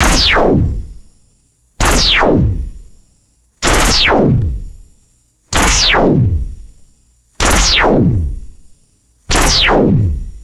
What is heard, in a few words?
repeated retro sci fi laser blasts; lasers